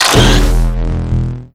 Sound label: reload